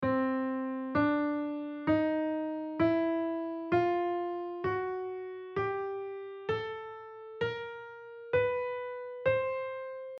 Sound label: blue note